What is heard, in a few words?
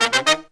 Pop & Funk Brass